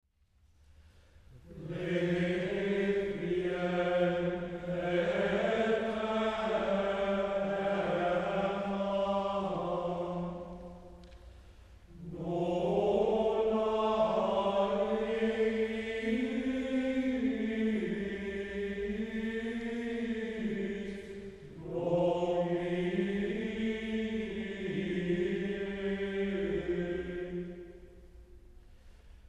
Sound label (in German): Choralschola Requiem aeternam